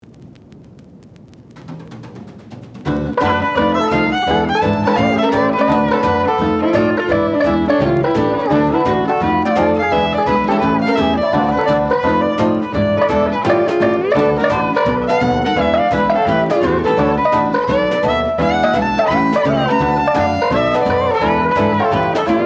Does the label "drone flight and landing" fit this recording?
No